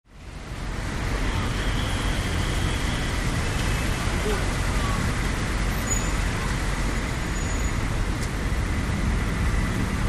Ambience: Medium City Traffic with Wet Street And Car Bys.